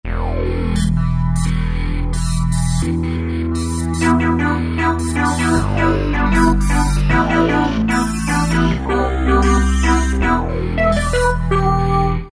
Hyper Wave, Korg N364; Factory Prog demos

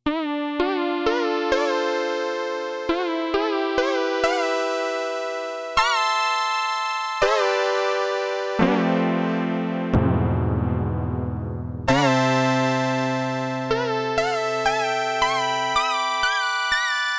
Fantasy, Casio CSM-1; Tones

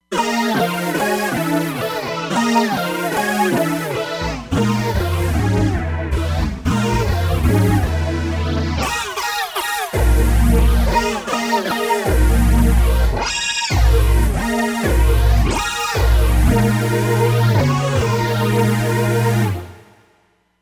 Raven Chord, Roland Fantom XR

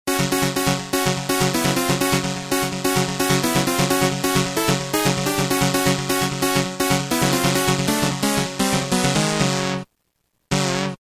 Trancey; Roland M-DC1

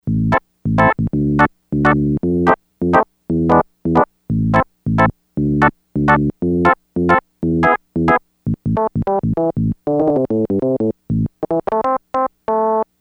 Yamaha DX100; Factory Patches

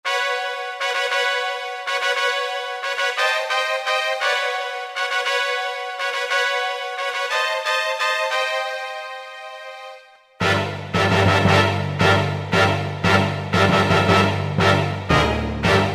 Bright Orchestra, Kurzweil K2000; STRSECT; Kurzweil K2000 Orchestral ROM; Orchestral ROM Supplemental Disk

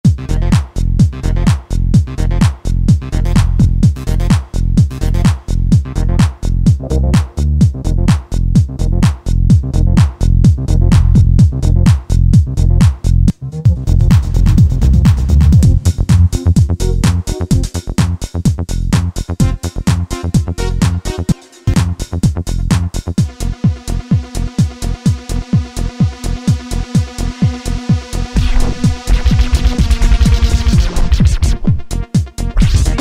Club Vibes

